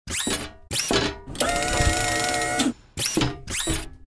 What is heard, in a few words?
transfer, vehicle; Cosmic Rage